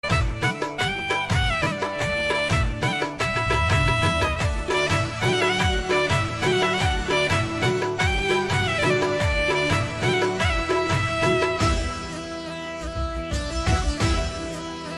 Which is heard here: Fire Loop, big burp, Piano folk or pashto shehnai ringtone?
pashto shehnai ringtone